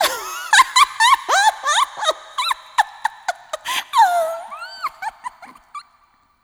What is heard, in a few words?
laugh; Sonic Foundry (Sony Creative Software)